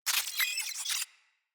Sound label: Space Drone Pain; Corpus